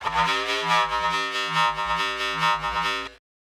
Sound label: Filtered, Dubstep, Basslines; GhostHack